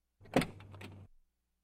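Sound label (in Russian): открывается дверь в машине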